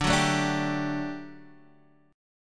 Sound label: G Major 7th Suspended 2nd D